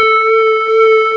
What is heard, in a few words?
TOY ORGAN; CMI Sound Library Rev 1.3